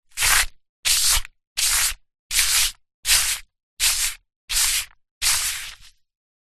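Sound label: tent ground sheet sweeping; foley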